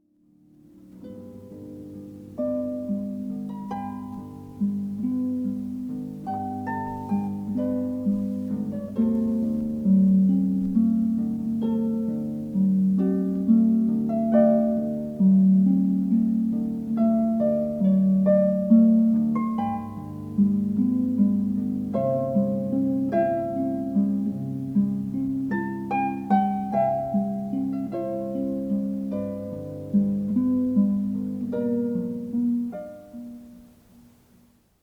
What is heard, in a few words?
Harp; Wedding Ceremony Music